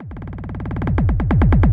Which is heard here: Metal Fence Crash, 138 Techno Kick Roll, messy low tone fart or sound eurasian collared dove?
138 Techno Kick Roll